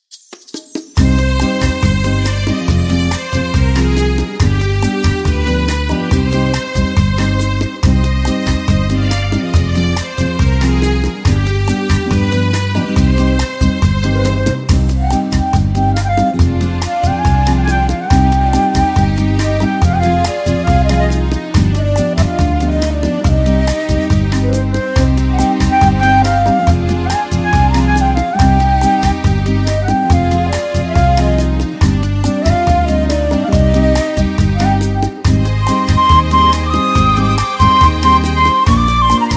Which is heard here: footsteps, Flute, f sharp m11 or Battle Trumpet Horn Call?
Flute